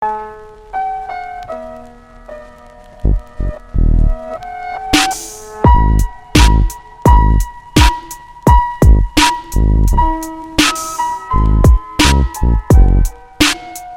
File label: Classical Piano